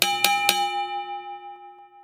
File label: Horse Cart Ringtone